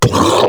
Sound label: Flying Mouth Pain; Warframe; ManInTheWall